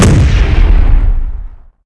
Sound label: rocket, weapons